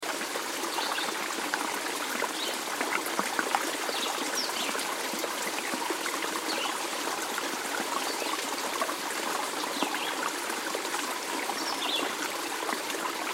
Creek Flow, Water Flow Through Underpass Bird Chirps Loop; Nature; Blastwave